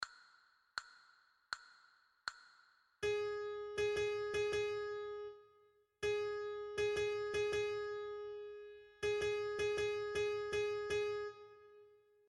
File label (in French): Sol diese, flute a bec